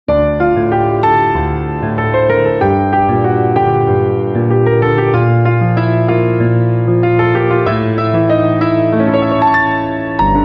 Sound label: Piano Instrumental Ringtone, south indian